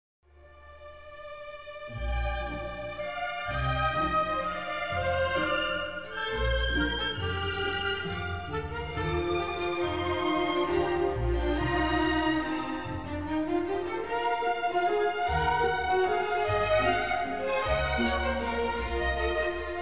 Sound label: Scherzo waltz; Abbado; fifth symphony on fate and love